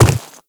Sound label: Quadruped Medium Walk Dirt, Footsteps